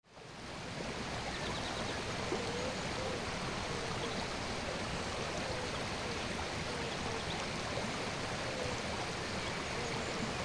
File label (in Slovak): potok pri ceste; bela